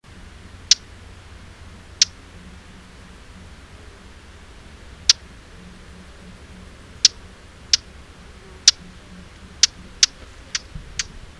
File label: Sylvia atricapilla; SETE